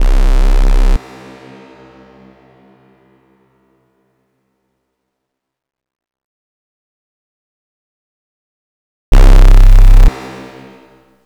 Saw Detuned Noise

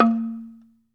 BAMBOO, Pitched Percussn, Bass Bamboo Mrmb; E-MU Producer Series Vol. 7 – Old World Instruments

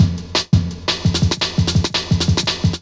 well known break, breakcore; massive edit of well known break

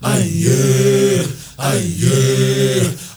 MALE, HUMMERS

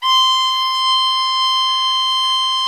STR VIOLA, Str Quartet, Strings

